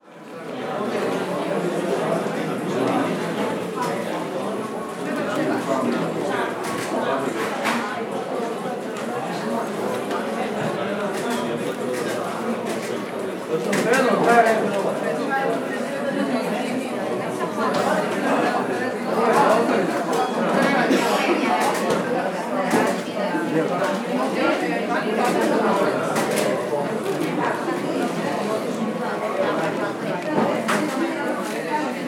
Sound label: Courthouse Lobby Ambience